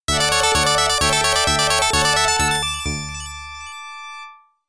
Found Item; Inindo Way of the Ninja; X68000

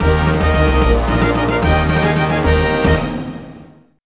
2nd Place Trophy Jingle; Nicktoons Racing

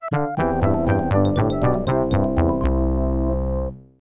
Yacht Win; mario s game gallery dos

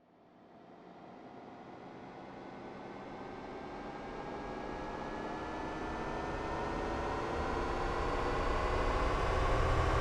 spaceship engine power up

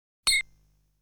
Sound that boss flashes with sword; legend of hero tonma

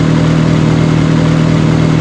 SFX - Engine; Twisted Metal 2

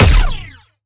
Sciweap Shot Medium Laser Rapid Stun Gun

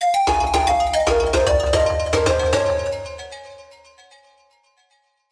Primal Rave Death; donkey kong country 2 fan remastered

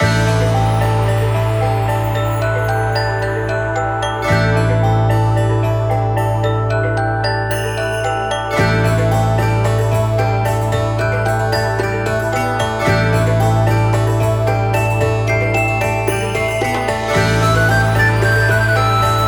Deep Valley; Echoria Ancient Echoes

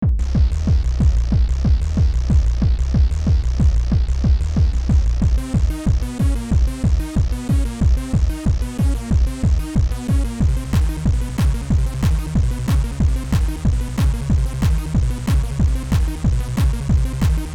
SineWaves; robotron x; playstation

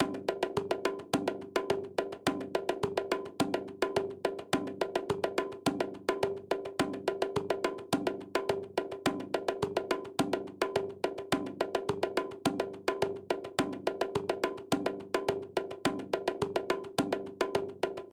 Tension Conga

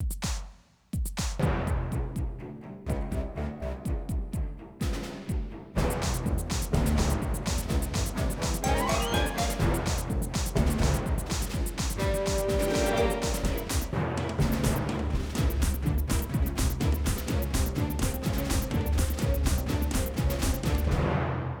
Boss Hi Part; Plants vs. Zombies Battle for Neighborville; DreadWood Quest2 Squash

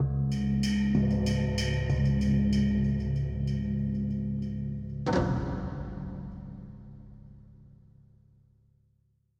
Cave PERC; Minotaur

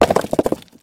rock

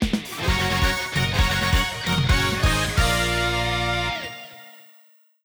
Jingle Fishing Clear; Tsuri Spirits; Nintendo Switch

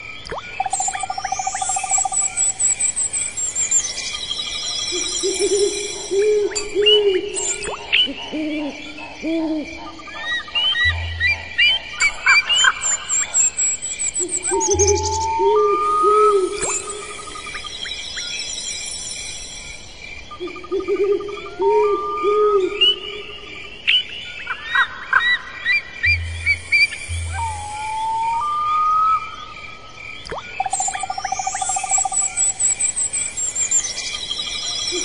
Ambient, Nighttime; rayman 2

